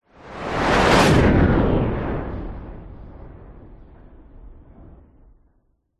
vehicles fighter jet pass by zoom past very fast designed